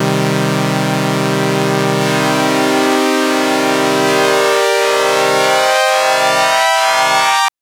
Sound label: Synth SyncSweep min c2; TrashLibrary